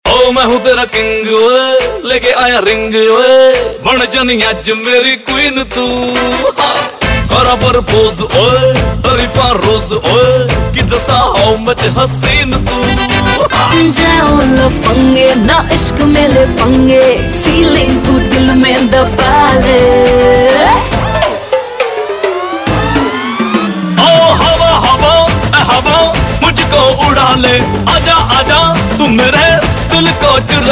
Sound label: Bollywood Song Ringtone